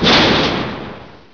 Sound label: door stop; Source Engine; bshift